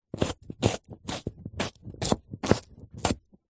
food new potato raw several gentle chops slices with large knife blade